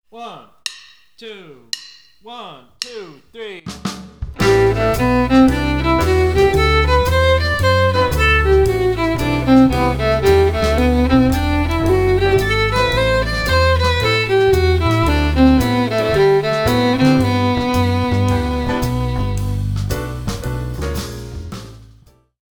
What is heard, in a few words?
Play-Along G Major Scale In Eighth Notes